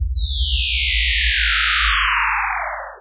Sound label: sci fi; Masterbits - Switch FX